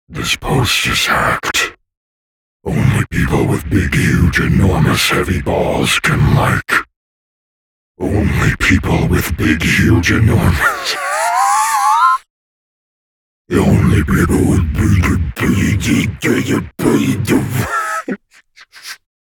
hacked post sfx

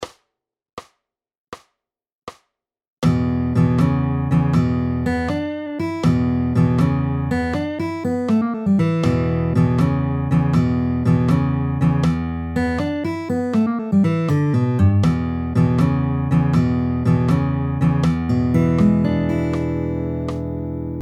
Shuffles and Licks, 80bpm; How to Mix Shuffles and Licks; Full Routine

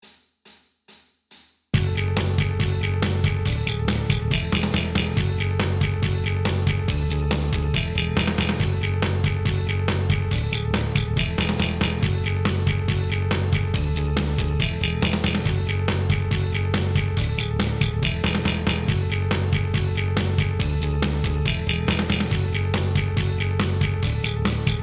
Neoclassical Backing Track Fast; Neoclassical Narcissist

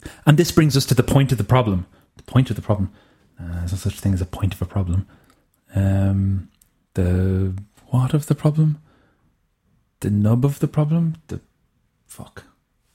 Sonification Bloop

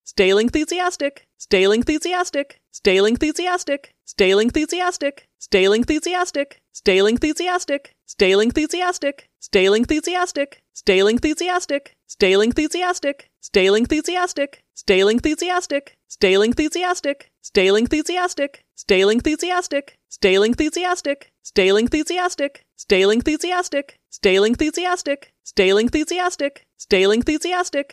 Speech To Song

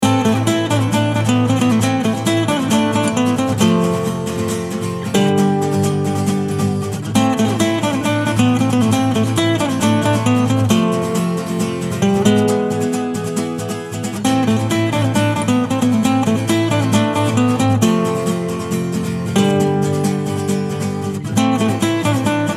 Dungeon; Phantasy Star

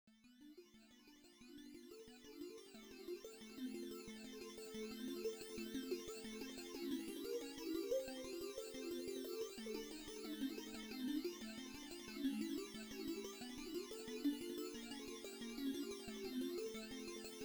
Arp

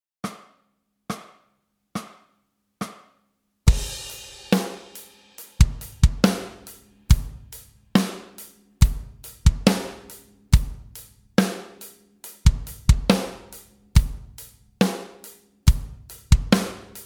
Backing Track; Exercise 1

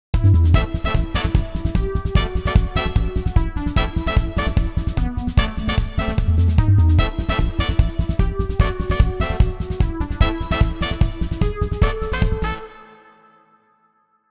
Intense Stinger

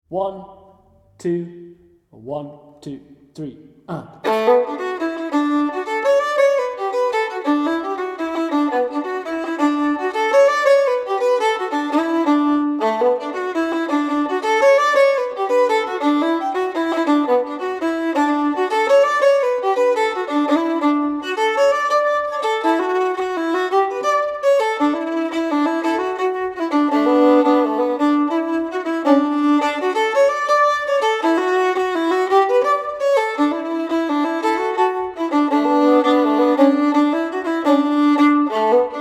Fiddle Full Speed; Da Lounge Bar

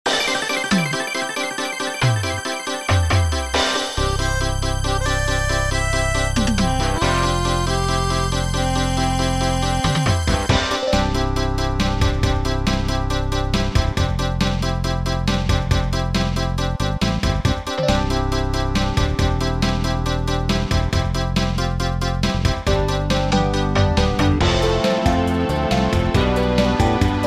SNES Instrumental Remix; Perfect Strangers Theme

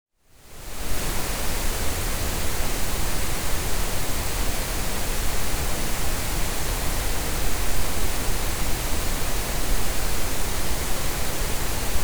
noise; Harman target; Oratory1990

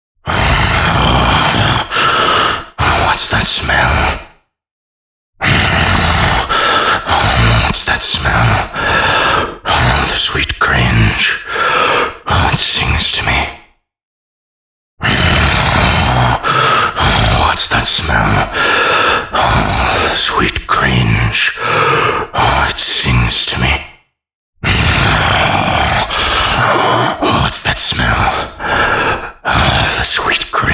cringe sfx

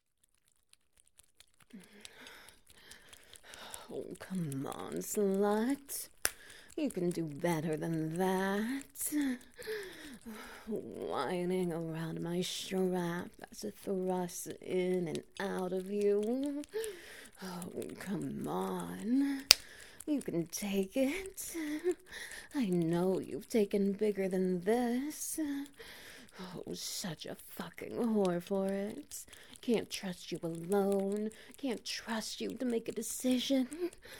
Wet Noises

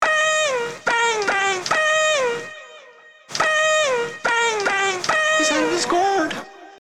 bing tone Android

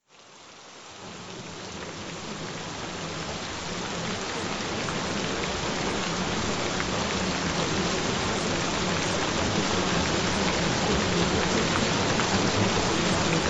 Gentle Rain Sounds; Regenerate your Telomeres and Stay Young Forever

